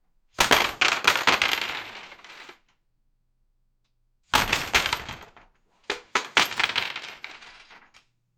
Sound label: a row of big paper, cardboard